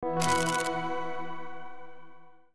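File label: puzzle house solved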